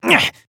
Demon Grunt Attack

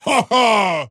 deny pain; Axe

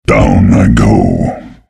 death; earthshaker

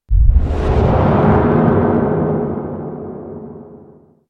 Mortal Strike; Wraith King; Dota 2

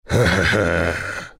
laugh; zh; Dota 2

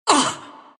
pain, ru; dota2

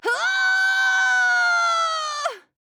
Falling; paladins; Huntress

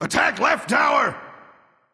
Tower; Full Metal Vulcan

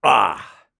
Grunt Slow; Assassin; Six-Shot Cyborg; Realm Royale

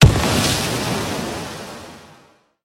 Boundless Strike cast; Staff of Gun-Yu

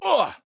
Grunt Jump; Mal'Damba; Paladins

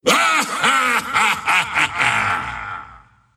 laugh; skeleton king

